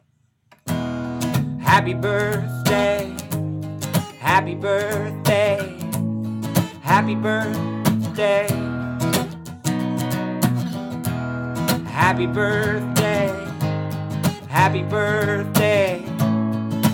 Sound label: Happy Birthday in English Guitar